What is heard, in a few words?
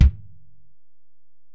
Ac BD, Alesis DM-PRO; 300 Drum Machines